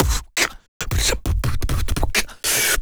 Human Beat Box, Drum & Bass; Loopmasters

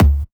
Toms

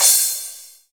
Crash, Cymbals

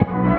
B STAB, funky; Loopmasters; Funky Underground Vol 2

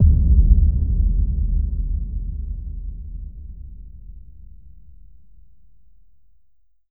FX Impact

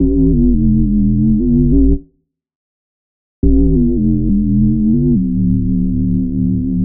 Bpm140 Bass Loop Am, Dubstep; Prime Loops; Dubstep Illusions

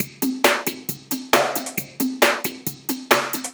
135 DRUMLOOP; Loopmasters; Booty Breaks Vol.2

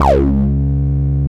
303 Db2; Jack Sparrow; Deep Dubstep Progressions

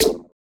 Percussive; Mutekki Media; Vengeance Electroshock Vol.2; VES2 Percussion

